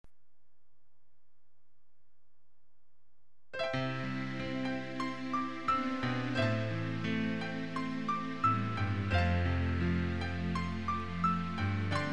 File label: Bethlehem Lullaby Chorus